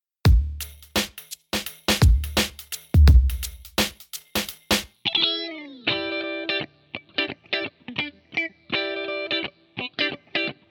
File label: bluesy